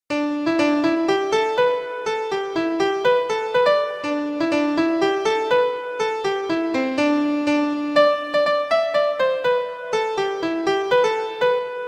120 bpm

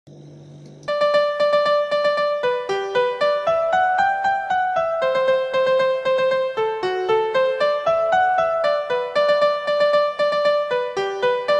galop

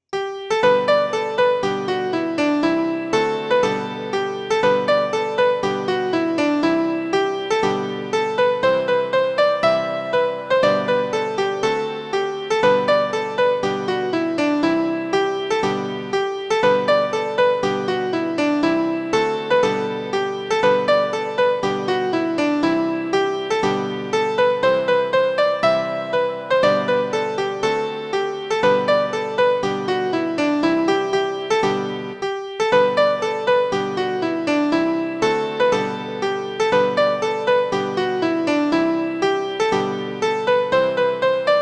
chords 120bpm; kelvin grove

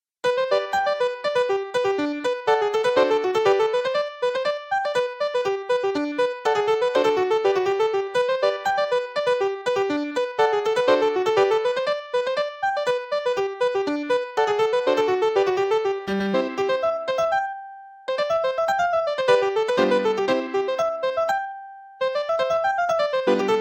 contra dance 120 bpm

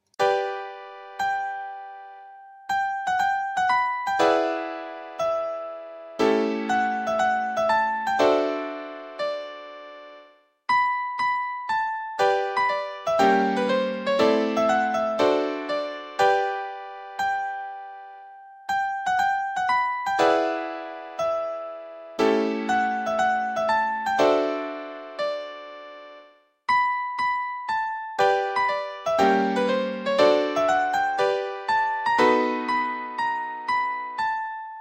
blues 60bpm

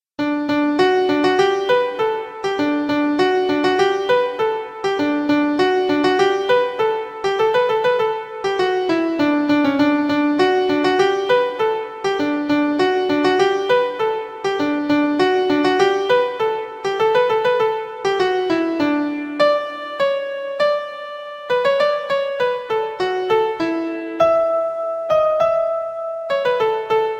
120 bpm

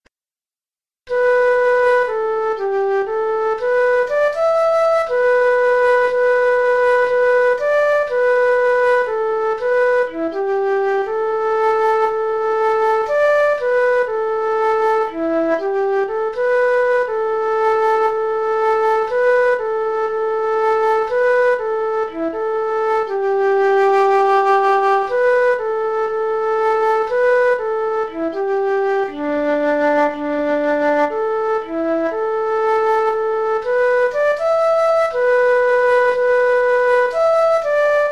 flute g

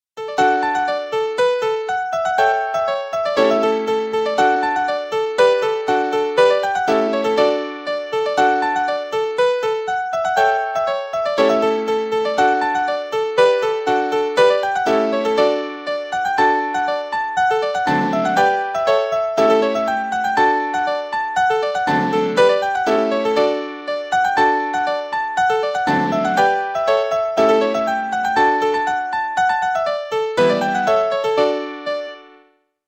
dance 120 bpm

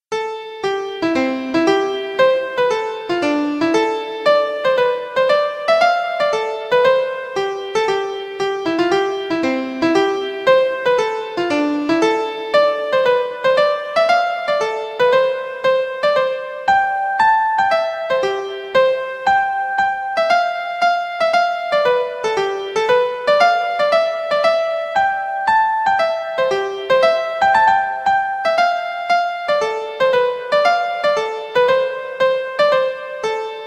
schottische 116 bpm

